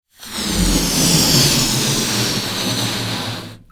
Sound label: Balloon, cartoon Foley Fx